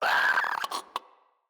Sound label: Infested Runner Hear Sound